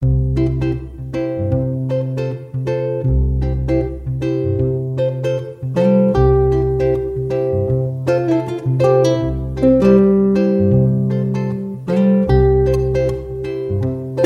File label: Instrumental